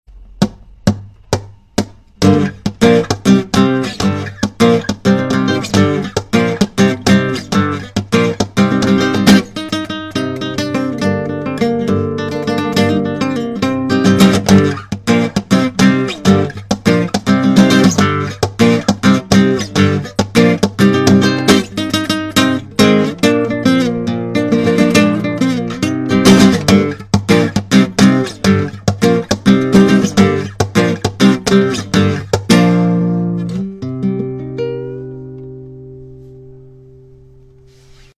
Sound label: Gitar